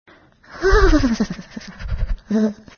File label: shivering